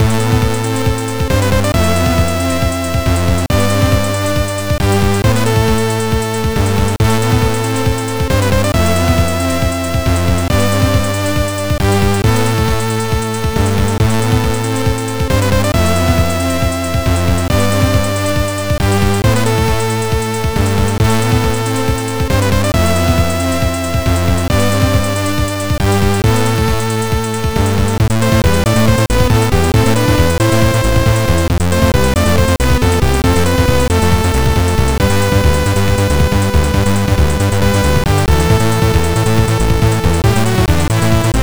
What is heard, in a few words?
amiga in game; golden axe